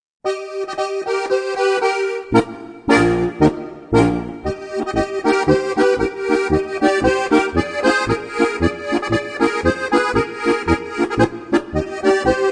oberkrainer potpourri

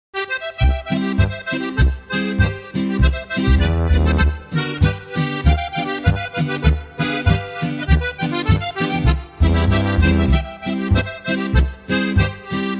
sterndruck boarischer

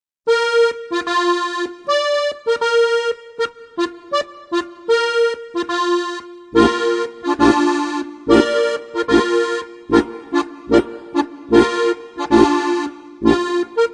reh tot signal; Halali

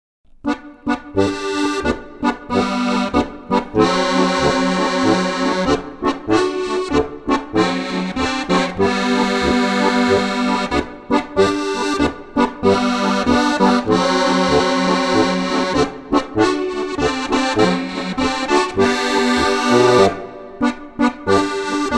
polka

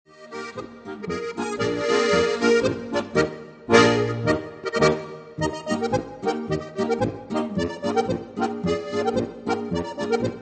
polka

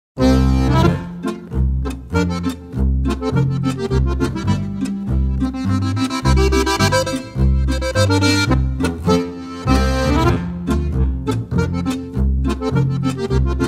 polka

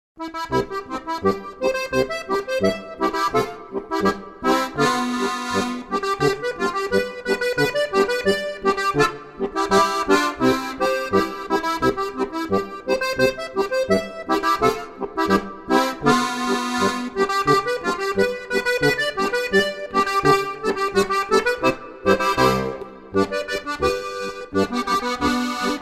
boarischer

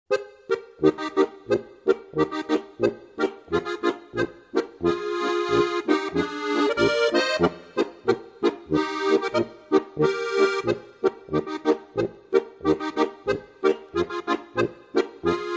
polka

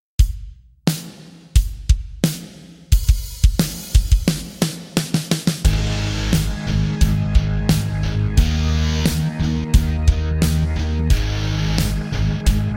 3er reihen rock; Knöpferl Geschichten 1

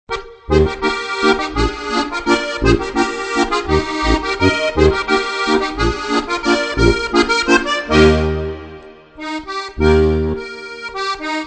bayrische vierzeiler steirische harmonika; Gstanzln

